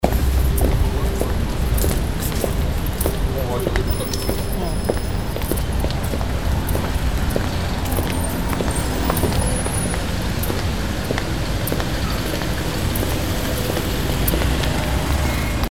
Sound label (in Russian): zvuk shagov; Звуки города